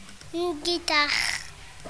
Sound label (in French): guitare; une guitare